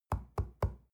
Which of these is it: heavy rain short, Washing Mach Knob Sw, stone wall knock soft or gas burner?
stone wall knock soft